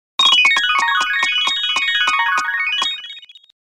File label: Nokia Alerts; Windows Phone apps; Wimple